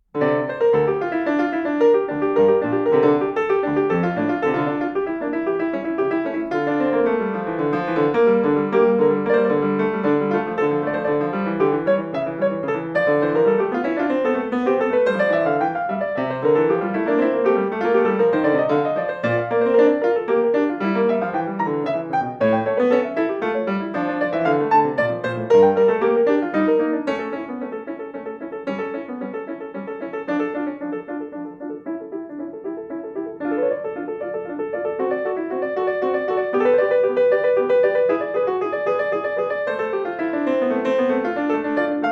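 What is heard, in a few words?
Prelude No.6 D minor, piano; Bach; BWV875; WTC Bk2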